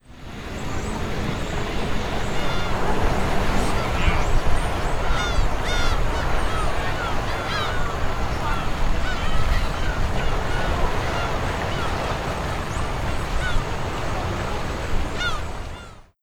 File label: Miami Marina